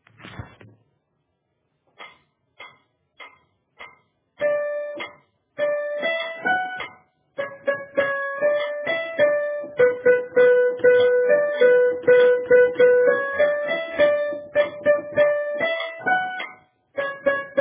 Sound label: Tenor Lower, Accompaniments